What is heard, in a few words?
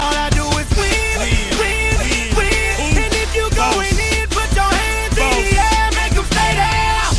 win